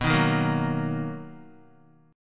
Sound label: B Major 7th B down Guitar; strummed; JGuitar